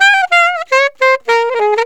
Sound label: sax; Digital Ear